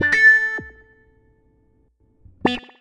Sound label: Wah Wah Gtr